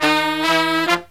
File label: Brass Riffs